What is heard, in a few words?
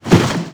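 slide, Material; XBOX; Freestyle Street Soccer; In Game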